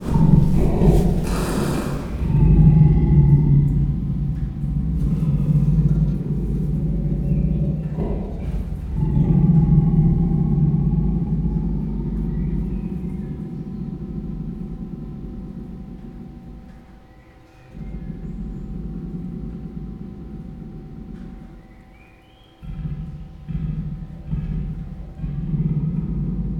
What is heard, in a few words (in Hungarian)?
lecsendesedő morgás, perzsa leopárd; pecsi zoo 2017